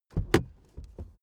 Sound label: suv open door hard quick